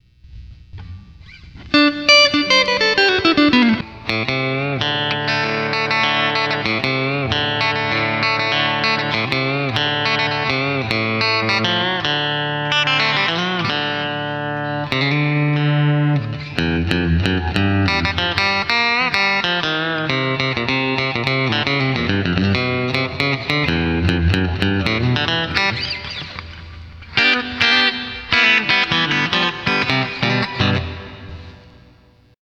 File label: Limiter, Boss GT-8